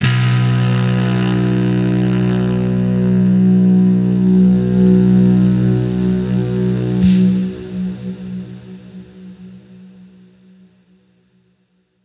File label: Overlay Drone